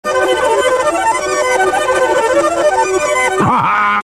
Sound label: ident spinback ha haaa; Chris Morris